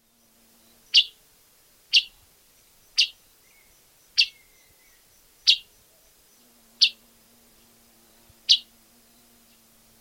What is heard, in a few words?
Red tanager